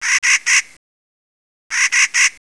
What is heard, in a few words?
nutcracker Clark